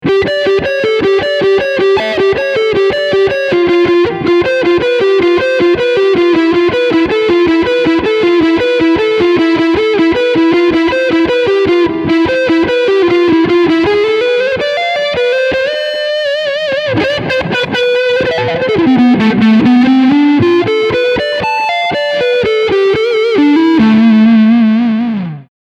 Front SC Overdrive

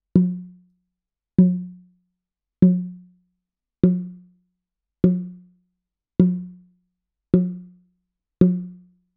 Warm Audio W47F Bongo

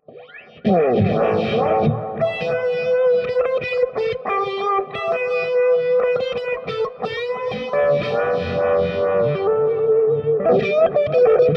Presets IR

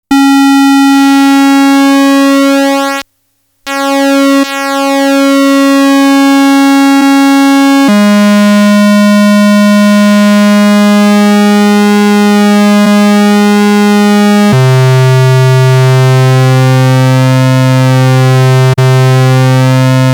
Korg MS-10

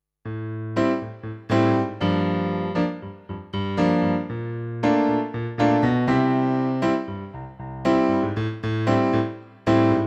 MX49 Piano

